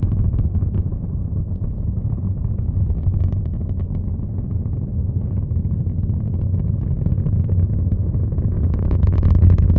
Carburant Consum Far; Puddle Plus

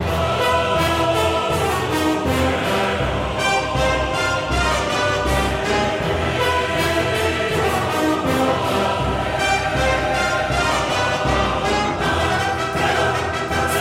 Battle Loop; Infinity Blade 3

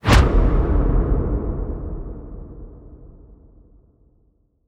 Logo Woosh; Solar Shifter EX

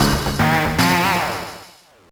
Goal Complete; The Sims Bustin' Out; gameboy advance gamerip

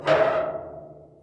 Creak Metal Other; Puddle; puddle plus ios gamerip 2018

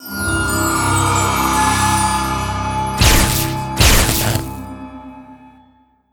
bounty win; Kill to Collect; windows gamerip 2016

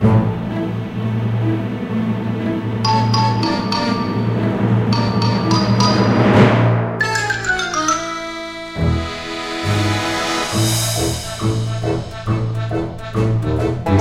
Quest Stinger Quest End Intro; Club Penguin Island; Android, iOS, MacOS, Windows

